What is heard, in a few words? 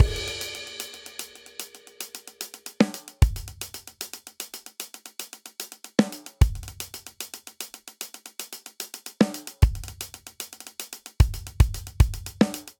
Triplet Hip Hop Breakdown 75 bpm, Drum; 4 4; MIDI Clips; Ableton; Factory Packs; Drum Booth